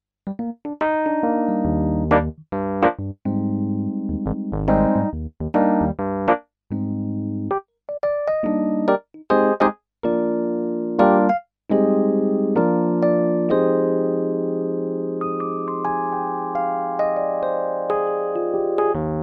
Numa Compact 2 Rhodes

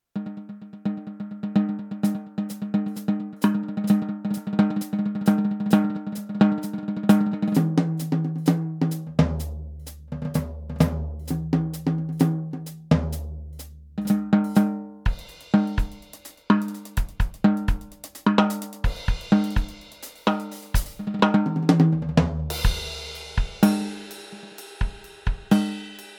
EFNOTE 7 Kit Snare Off

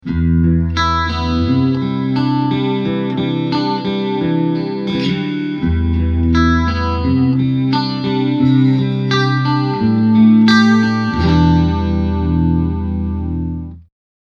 clean sweet chorus

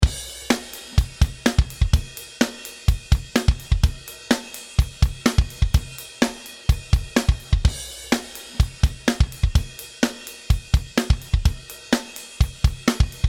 Drums 160

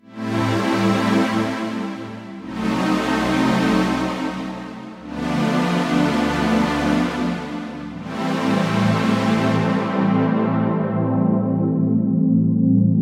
FM PWM Pad

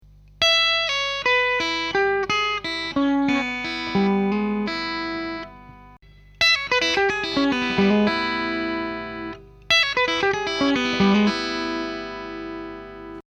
Lick

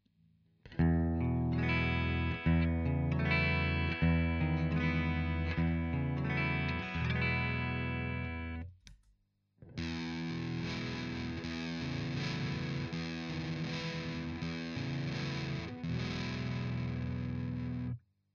Bridge Sustain

